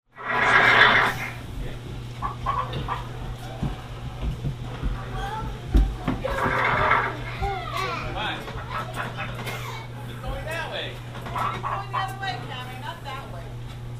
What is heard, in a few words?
Blacksmith; Fort Langhorn